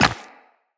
Run Rock, footsteps; Operator

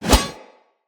Sword Whoosh; Weapons; Swords; Dendrit Sword And Board

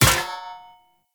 Short Sword Medium Parry Impact

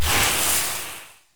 Shotgun Hit Fx Stinger; Pax Duviricus

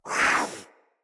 Kavat Vocal Attack Short; Warframes; Khora

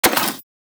Rifle Mech Alt; Warframe; Weapons; Thanotech

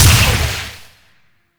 Shot Small; Weapons

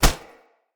Unarmed Punch Impact Flesh Armour Hollow; Weapon Impacts; Player; Combat

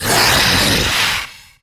Pain, Barks; Warframe; MiTW

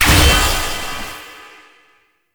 Shock Activate; Warframe; Eximus

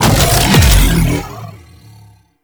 Grendel Deluxe Bowl Start; Warframe

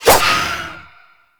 Void Dagger Whoosh, melee weapons; Warframe; Zariman Dagger; Void Mode

